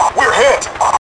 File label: Hit; SINDEMO; pilot